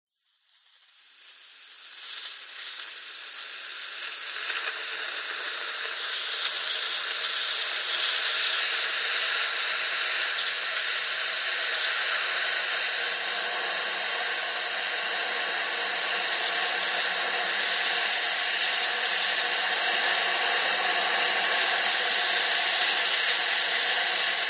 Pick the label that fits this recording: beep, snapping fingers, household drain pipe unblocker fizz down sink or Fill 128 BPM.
household drain pipe unblocker fizz down sink